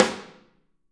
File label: Snare OH; Snare OH All; Drums Percussion